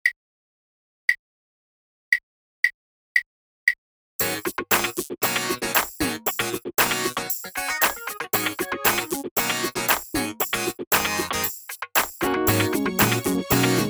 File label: drumless